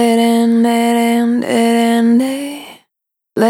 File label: Spin Dry, Single Voice Melodic Loops